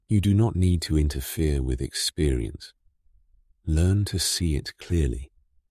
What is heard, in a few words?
drop, remix